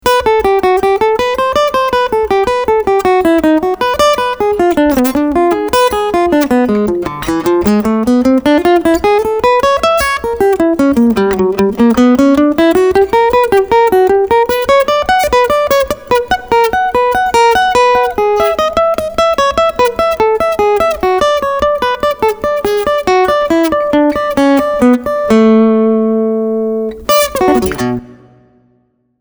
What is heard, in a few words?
Single Note Picking Preamp